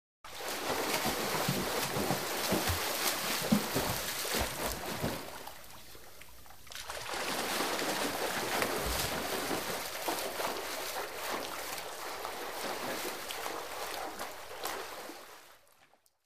Swimming; Swimmer Comes To A Stop, Then Continues.